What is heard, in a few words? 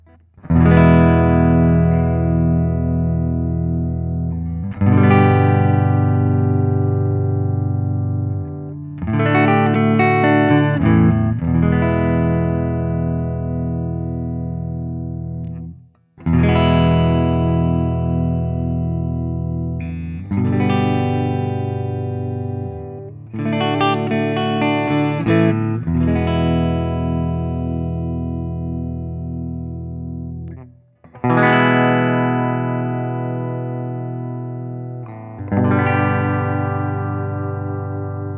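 Guild Bluesbird Holoflake ODS100 Open, guitar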